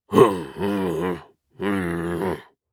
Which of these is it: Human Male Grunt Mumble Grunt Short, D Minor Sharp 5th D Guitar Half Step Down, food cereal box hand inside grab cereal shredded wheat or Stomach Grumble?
Human Male Grunt Mumble Grunt Short